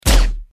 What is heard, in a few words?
Silenced Single Gun